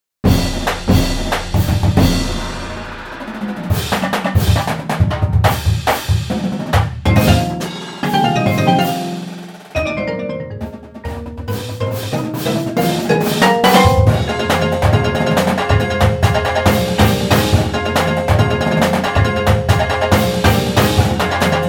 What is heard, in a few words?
Drum Solo